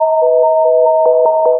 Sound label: police japan